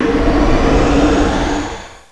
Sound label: Wind Walk; warcraft3